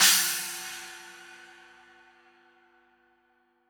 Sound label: Cymbals crash; Vengeance Samples